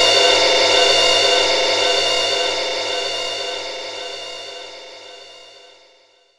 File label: Crash Cym Lp; Roland M-OC1